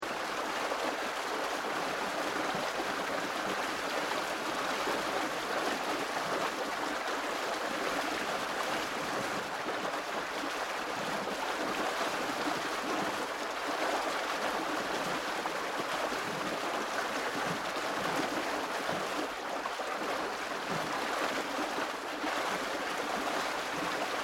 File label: Nature Countryside Waterways Weir Canal Water Behind Sluice Gate Strong Fast Flowing; Water Bubbling; Tom Hutchings